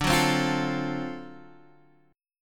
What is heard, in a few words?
D 7th Sharp 9th, chord